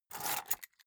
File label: key ring large grab table